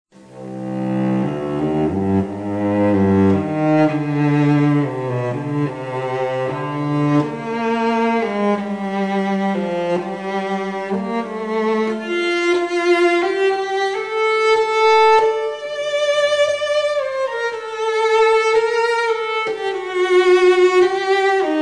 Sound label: Cello